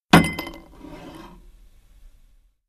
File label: glass on